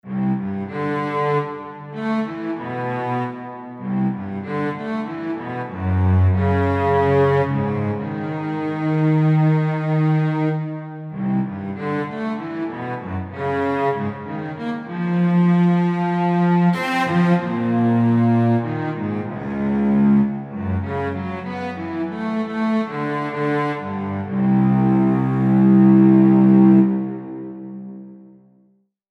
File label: Circle of Fifths Music